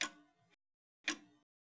clock; Mafie 1

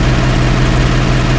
ford V8; NPC; Mafie 1

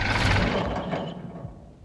fordT off; Mafie 1

